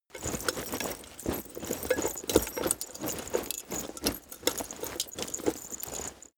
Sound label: leather metal movement hard